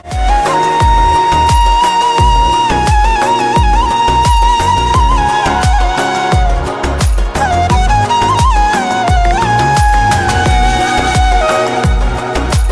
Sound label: Ringtone